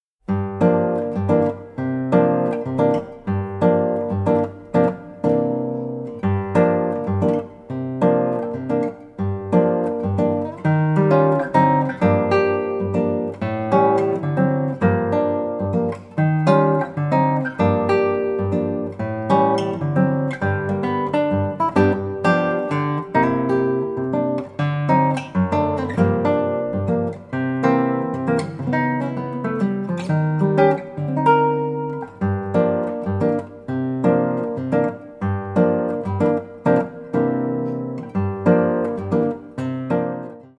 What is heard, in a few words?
Pensiero jazz